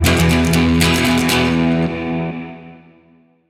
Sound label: Victory Stinger